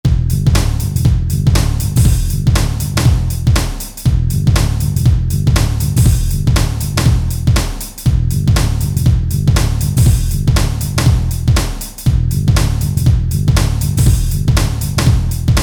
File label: Rap Hip Hop